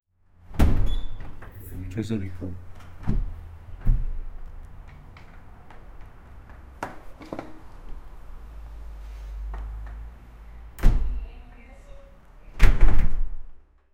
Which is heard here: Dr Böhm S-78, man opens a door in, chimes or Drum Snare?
man opens a door in